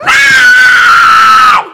jump; freak fortress 2; sammy sonic fan

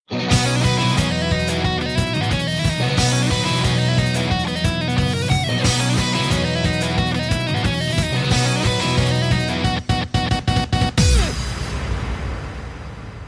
intro music; freak fortress 2; pop team epic awakened

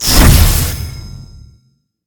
teleport; w scout

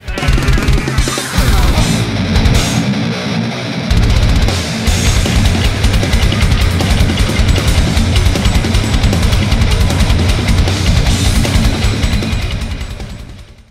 intro music; freak fortress 2; cwm medicbot

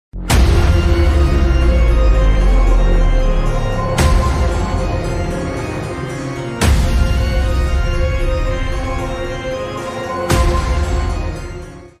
intro music; phantom blade

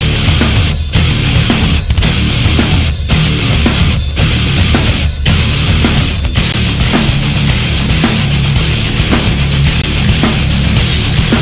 intro music; makavalz

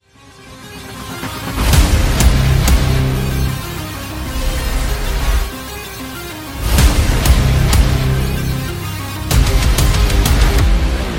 intro edgy; iberia; faith

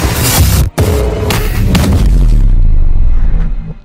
instant teleport

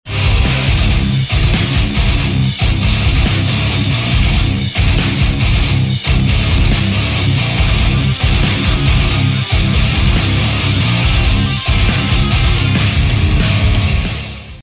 intro music; ff2; zanki

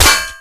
protection hit

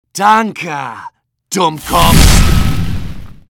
fire; magic bullet

